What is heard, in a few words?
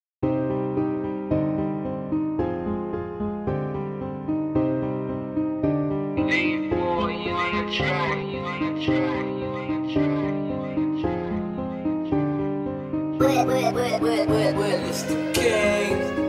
Instrumental